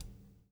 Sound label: PULL, Backbeat; Spectrasonics - Backbeat